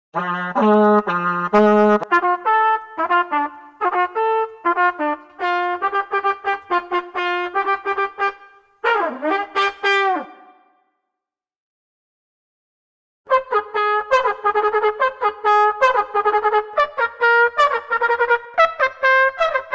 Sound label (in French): tromp seule